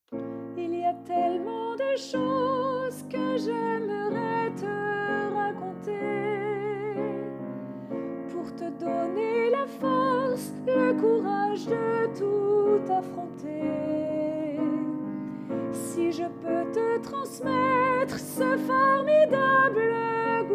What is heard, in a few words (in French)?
couplet 2 hommes, chorale